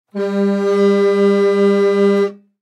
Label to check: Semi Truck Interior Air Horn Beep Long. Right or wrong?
Right